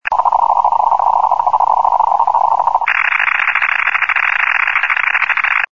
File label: loran c